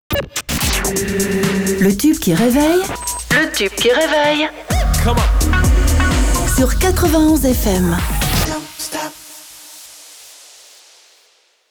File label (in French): Jingle Tube qui reveille; Le Réveil de Jingle; Habillages